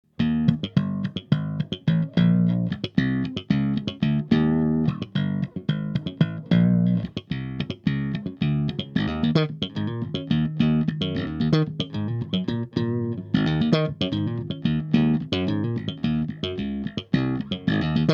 EBS Mikro SKC Funky

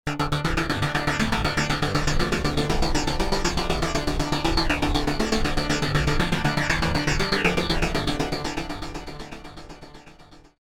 Pulses

